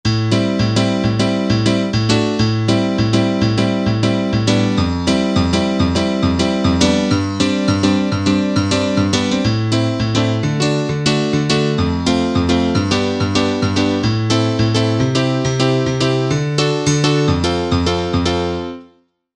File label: M1 Piano